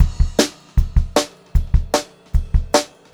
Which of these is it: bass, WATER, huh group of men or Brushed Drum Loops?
Brushed Drum Loops